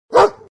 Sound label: Real Dog Bark